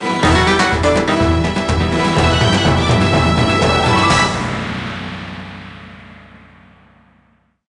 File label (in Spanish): Tema de victoria; Pit Sombrio; SSB4